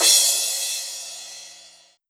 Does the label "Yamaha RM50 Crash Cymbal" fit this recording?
Yes